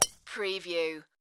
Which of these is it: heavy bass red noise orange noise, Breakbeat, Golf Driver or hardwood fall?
Golf Driver